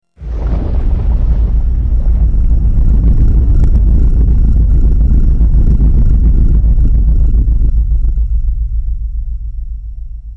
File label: no vocals